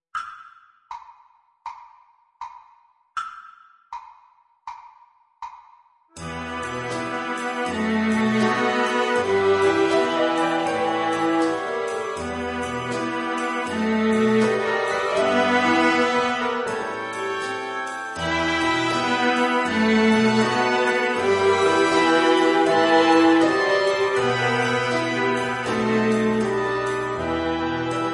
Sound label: Low down blues; Hey Presto for viola bk 1 bronze